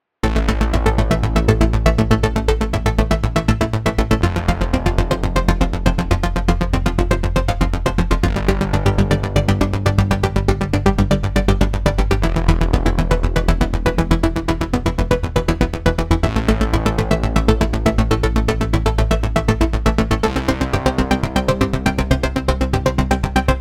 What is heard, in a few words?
Retro Industry